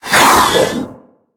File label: pain; Awesomenauts